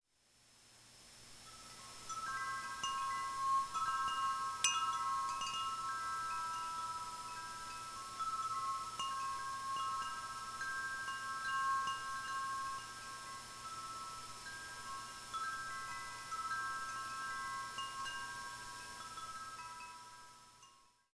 wind chimes; Sparkling Brook

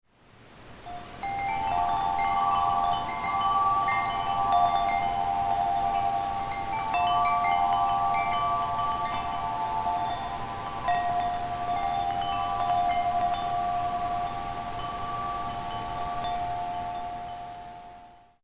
wind chimes; Spring Meadow Song

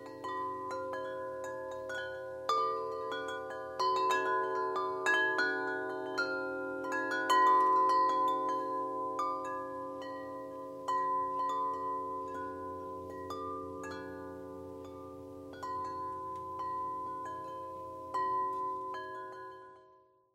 43in, metal wind chimes; Bronze,Gold & Silver; Mountain Serenade

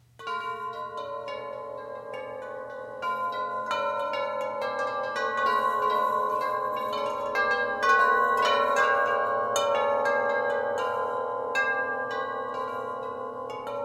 Grandfather Clock, wind chimes; Bronze,Gold,& silver; 78in

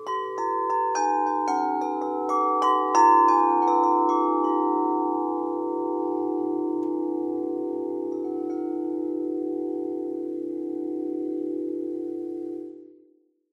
64in, metal wind chimes; Twilight series; Dream Maker

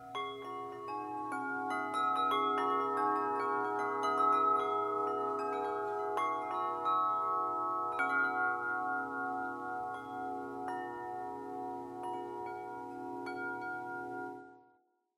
Bells 48in, metal wind chimes; Harmonica; Bibical Bells

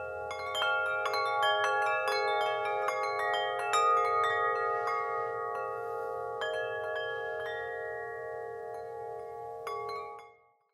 wind chimes; Evergreen series; Tranquil Rain 37in

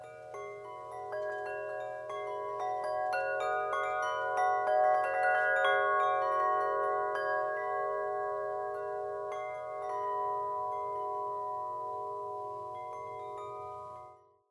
Bells, wind chimes; 43in; Biblical Bells; Victory Bells of Jericho

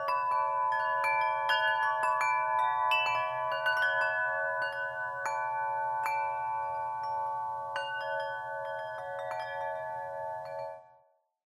Songbird 32in music, metal wind chimes; Evergreen series

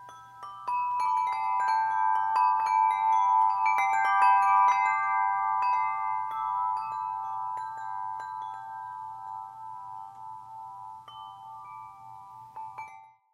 Bells 26in, metal wind chimes; Bibical Bells; Melody of the Heart

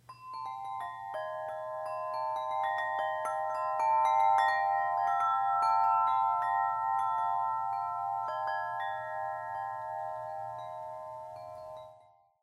Bells, wind chimes; 31in; Bibical Bells; Song of Solomon

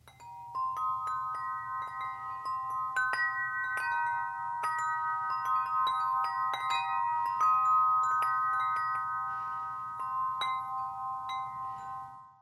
28in, metal wind chimes; Evergreen series; Tinker Belle

